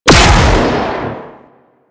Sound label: Auto Turret Shot; Warframe; Venus Turrets